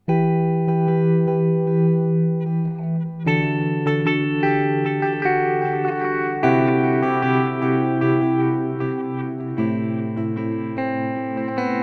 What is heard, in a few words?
Pan; Free the Tone Future Factory